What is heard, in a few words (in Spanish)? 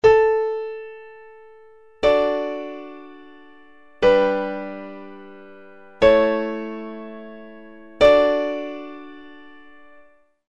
cadencia